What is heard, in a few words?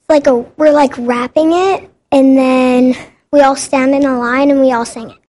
a singing; 32nd annual focus on youth festival; Students from around the valley are showcasing their talents this week.